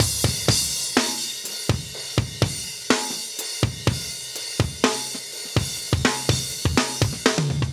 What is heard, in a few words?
124 live breaks